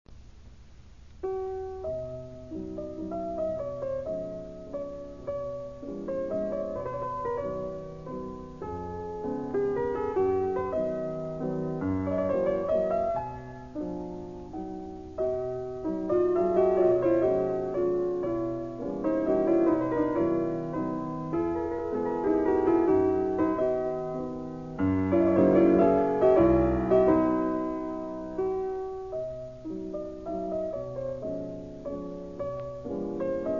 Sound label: Mazurka; Chopin